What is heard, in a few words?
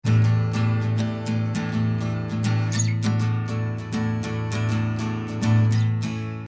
Guitar Soundhole LDC; Recording Mistakes